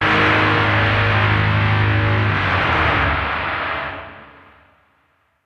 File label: battle lose; Princess Project